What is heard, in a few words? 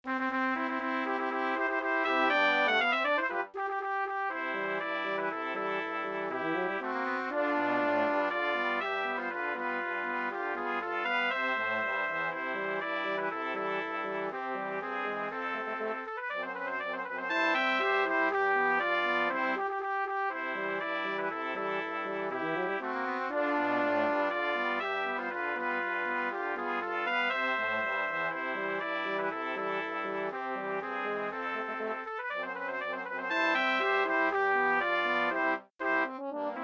March, Brass Quintet; Denver Auditoruim March